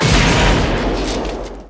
Explo Small; SFX Kit; Destruction

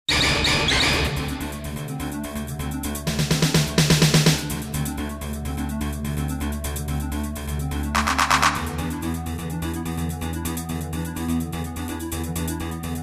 Jingles; 90 DDD Jingles

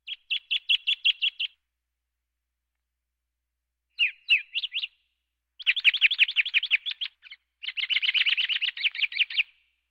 Birds

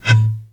Blow Bottle Mortar Shot; SFX Kit; Weapons

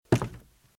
Footsteps - Wood - Creaky - Walk - Right; RadwinIsland

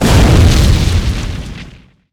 Explo Rock; Destruction

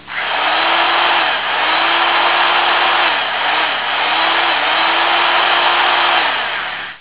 electric drill; 1001 Sound Effects

